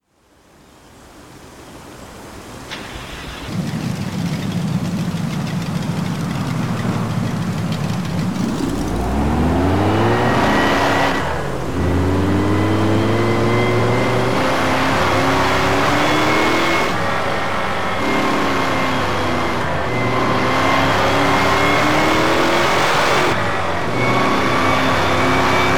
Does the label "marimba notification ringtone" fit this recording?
No